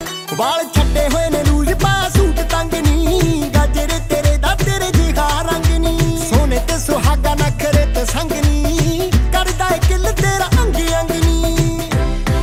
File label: Punjabi Beats Ringtone